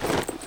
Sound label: Rustle Human Jump Gear; SFX Kit; Foley - Rustle